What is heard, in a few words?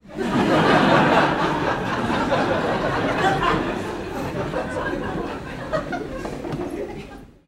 human audience laughter comedy club x200 people; komedia brighton comic boom